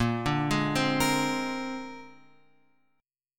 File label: A sharp 9th A sharp Guitar Open F; arpeggiated; JGuitar